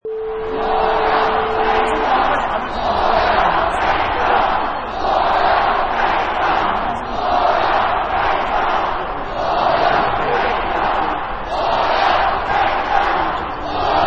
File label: Arena Crowd Yells Airball and Defense